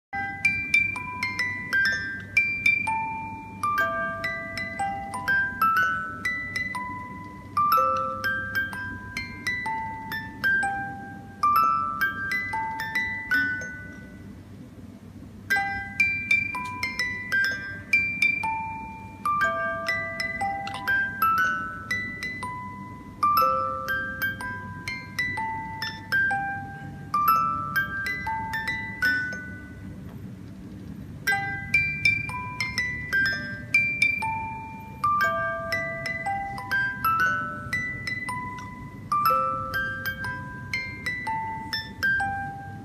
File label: Music Box